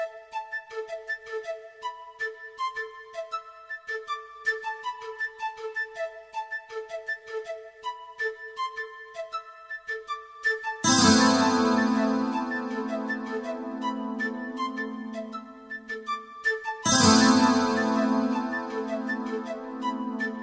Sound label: instrumental ringtone